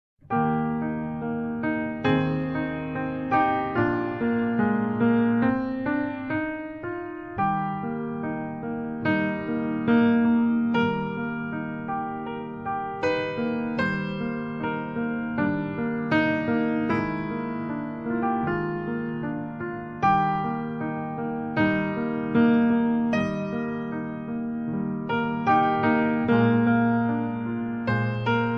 Port De Bras Female

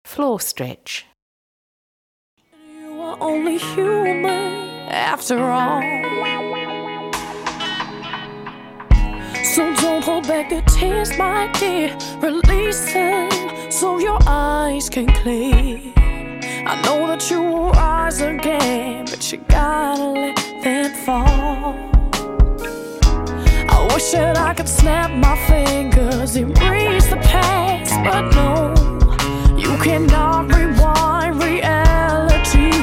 Floor Stretch

